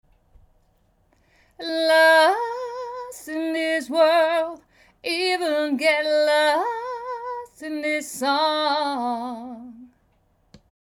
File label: Vocal Break